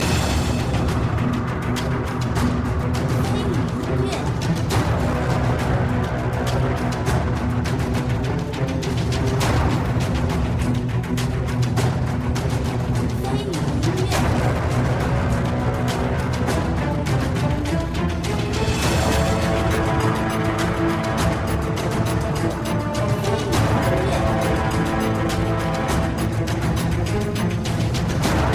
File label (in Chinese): PVE场景